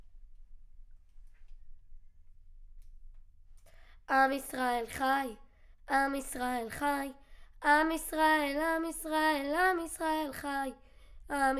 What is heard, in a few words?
Children Choir; Studio Bekol Children Choir